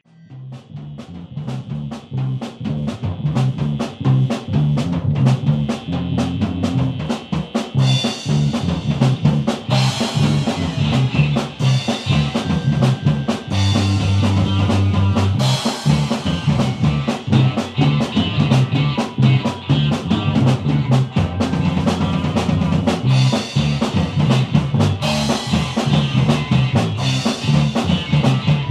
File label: Another Fat Bass Riff